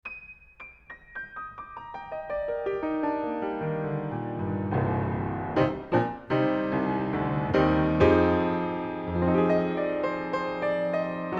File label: Piano, Close Outside Mic